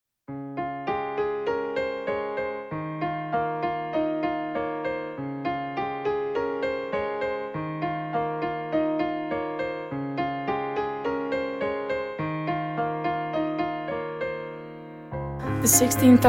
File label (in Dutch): Creatieve Opdrachten muziek